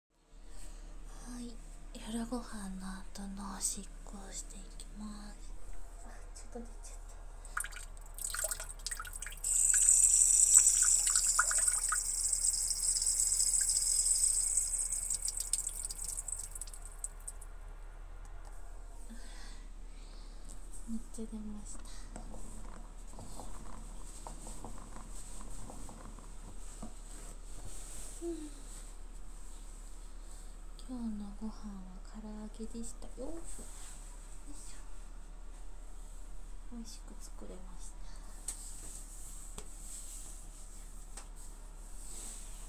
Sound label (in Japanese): トイレでオシッコ; ４日目・夕食後の